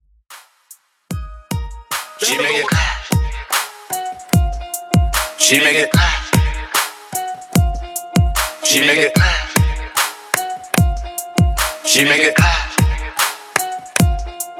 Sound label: Hip Hop Redrum In Outro 149 BPM